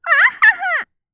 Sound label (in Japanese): わーはっは, 笑い声